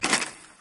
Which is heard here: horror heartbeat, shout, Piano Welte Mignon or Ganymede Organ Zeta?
shout